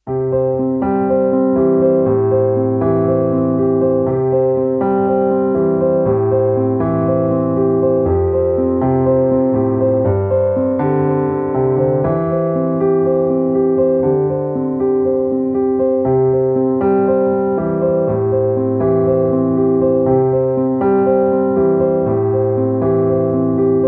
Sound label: Bubble Bath